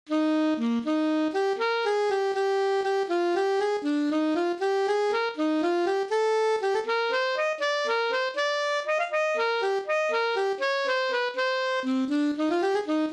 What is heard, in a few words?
altosax